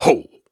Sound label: Grunts and Chants, Viking Vocal